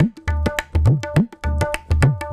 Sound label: Tabla